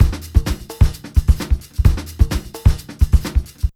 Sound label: HOT BEAT, Roots Disco; USB Soundscan vol.32; AKAI